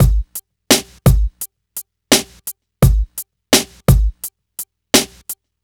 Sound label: DRM, Hip Hop and R&B Drumloops; Best Service; ProSamples vol.01; AKAI